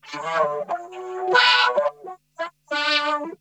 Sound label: Funk Guitar; 90 s Sample CDs; Zero G